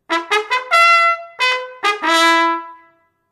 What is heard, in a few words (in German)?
Ruf 3. Eskadron, Kavallerie